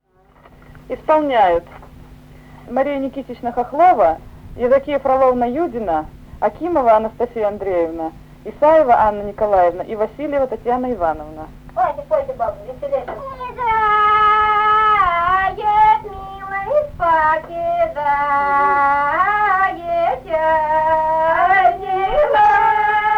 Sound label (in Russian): Отъезжает милый, спокидает (лирическая); Ившино